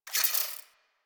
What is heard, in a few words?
Tech One Off; Ambience; Warframe; Rail Jack